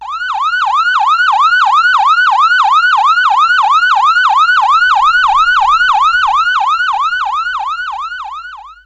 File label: Siren; gfactory; vehicles